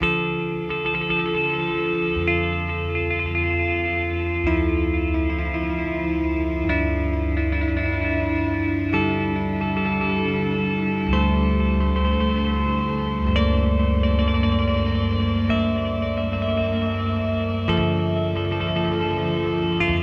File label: EQD Afterneath Reverberator